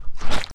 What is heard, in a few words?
Splash